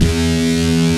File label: STICK; Vintage